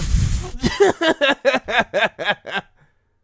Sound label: game over